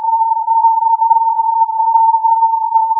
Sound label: filtered input